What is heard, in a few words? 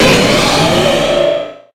pokemon cry